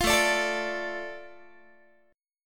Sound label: A Suspended 4th Sharp 5th, A, Guitar; Asus4#5; strummed; 20,17,17,19,18,x